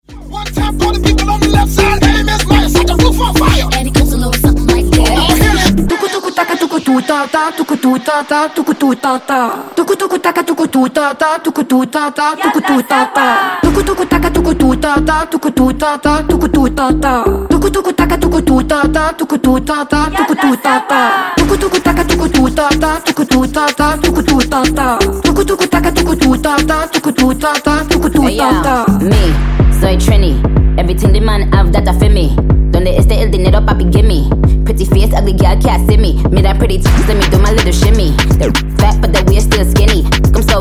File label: Acapella Hype Intro Outro 124 Bpm